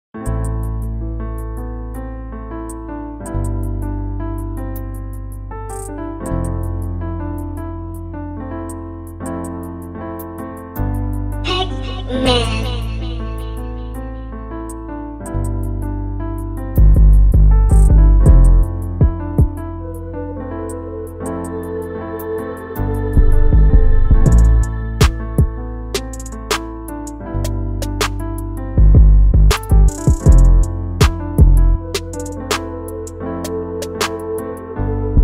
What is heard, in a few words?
Instrumental